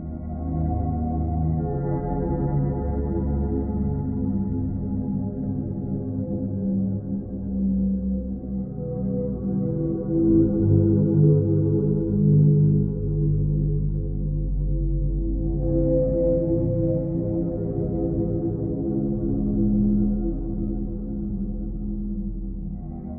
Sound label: old Seal Loop; 23s; Kethotum